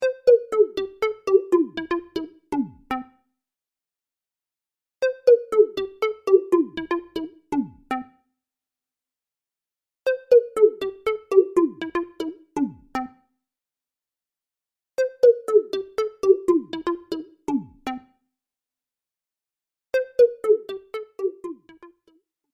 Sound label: Synth